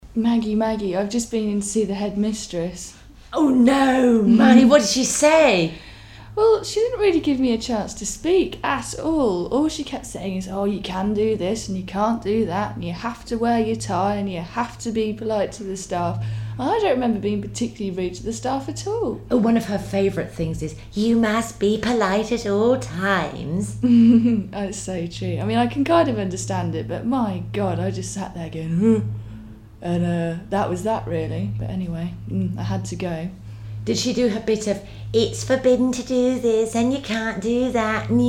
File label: girls laughing